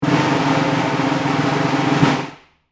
Field drum bounce rolls

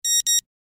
System alert beeps

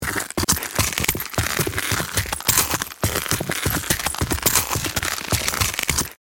Footsteps on broken glass shoes run; impacts

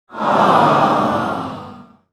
Crowd aww sitcom reaction

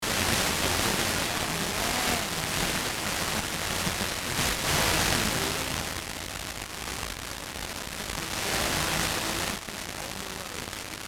Radio static noise loopable

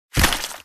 Cartoon splat noise large

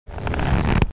Leather baseball movement creak

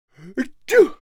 Man quiet sneeze; sneeze sound effects; gfxsounds

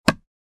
Wood panel impact

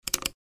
Apple magic mouse click; Mouse Sound Effects; Mouse Clicks and Mouse Wheel Sounds

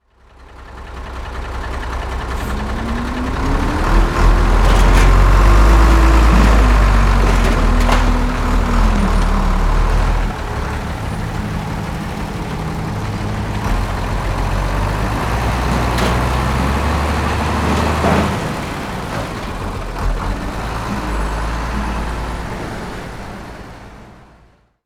Garbage truck moving back and forth; construction vehicles

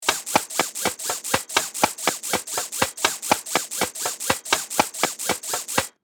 Quadruped robot toy movement; rc toys

